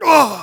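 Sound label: pain sharp, German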